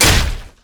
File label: basicattack human; LoL; shyvana